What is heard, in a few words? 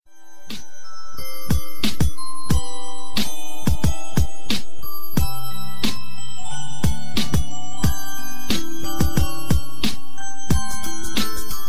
christmas bells and beat